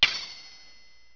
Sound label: SHRT TWNG; toon